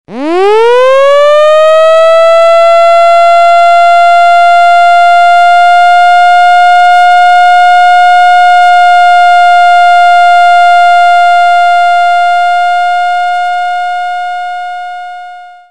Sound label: Continuous Siren, alarm signals; Description: All Clear; The threat has passed — it is now safe to resume normal operations